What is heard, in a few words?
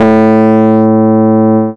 BASS; SoundFactory